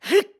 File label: Attack; BOTW; Breath of the Wild; Link